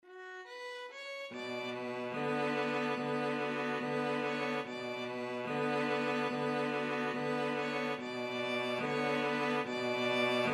violin cello duet

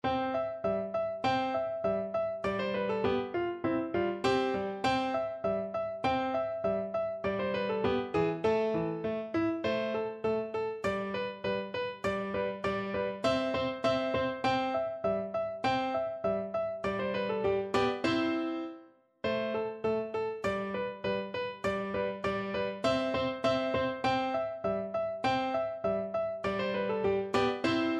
Turkish March PNO

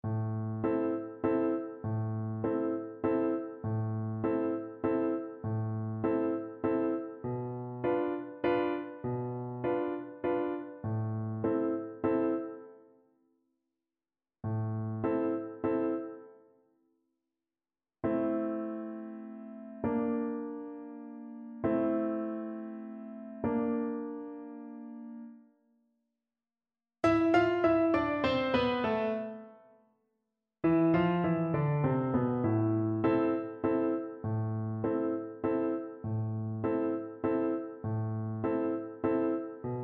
Oboe

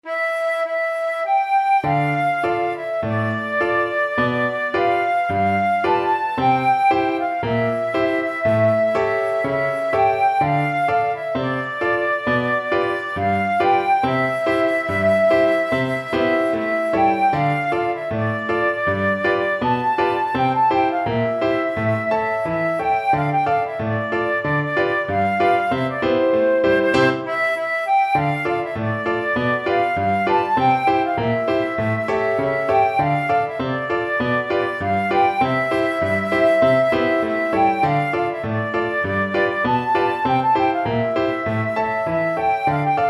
flute

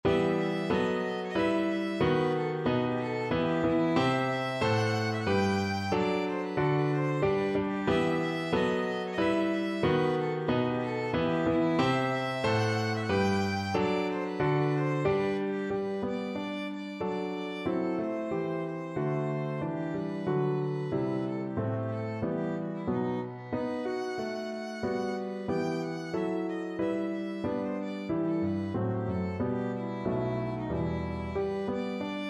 violin; beveridge maggot

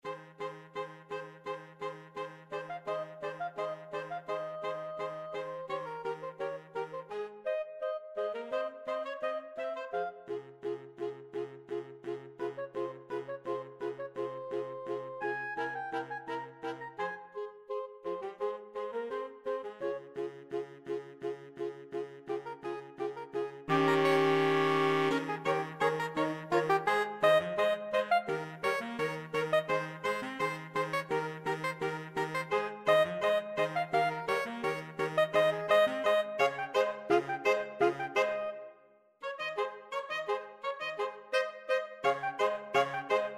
saxophone quartet

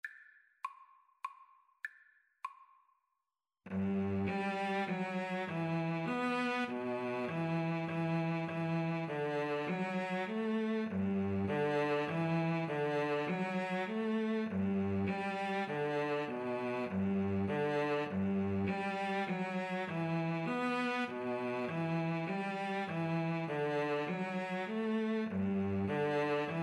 star spangled banner, Violin-Cello Duet

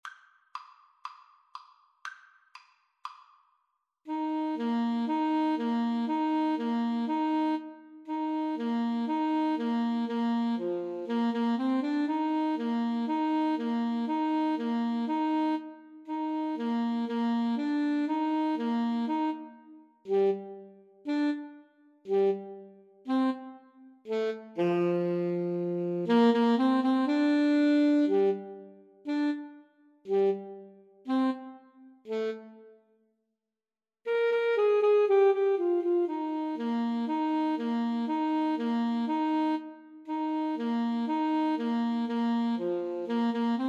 baltimore belle 2 A SAX

